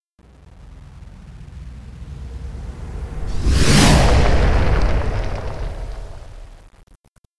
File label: Fly By Sound; Learn to Fly 3